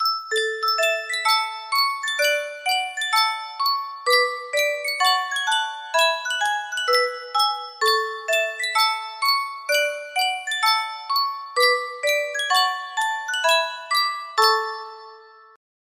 yunsheng music box

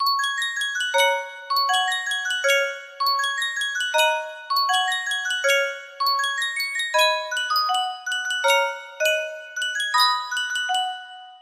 Sankyo Music Box

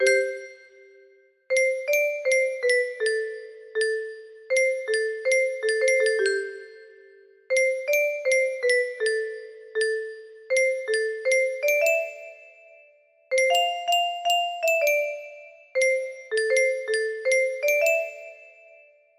Untitled music box melody

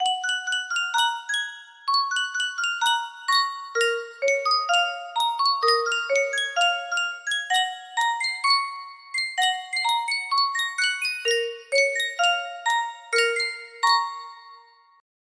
music box

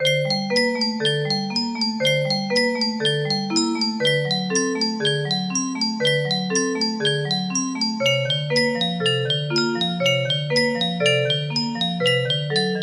music box theme; little nightmares; six

